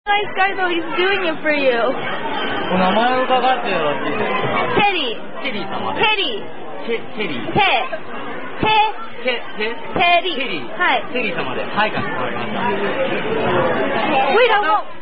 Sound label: Happy Birthday, Wait Staff; Outback; In Japan